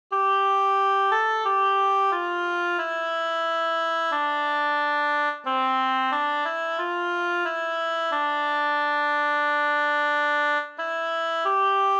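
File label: solo oboe; Alle Jahre wieder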